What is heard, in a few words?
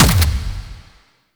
Weapon Fire Start; Warframe; RailJack Weapons; Modular Weapons